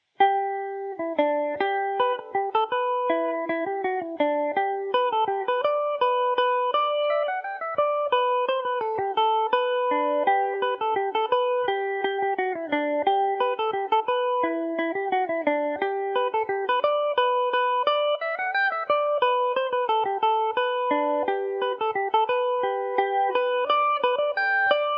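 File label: G major mandobird, irish mandolin; farewell to whiskey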